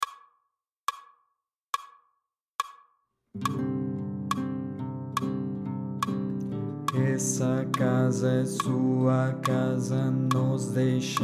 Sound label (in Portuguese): baixo